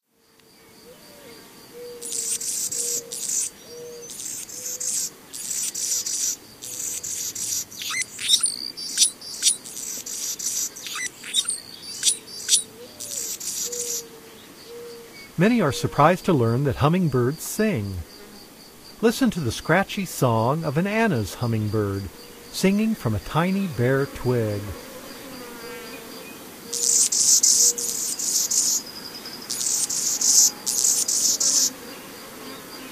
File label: Annas Hummingbird; North America; Common Western Species; Know Your Bird Sounds